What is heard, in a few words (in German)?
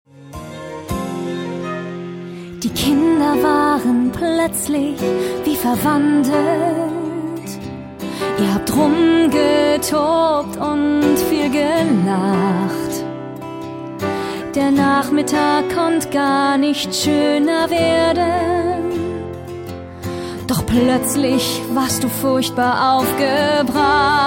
Gesang Musical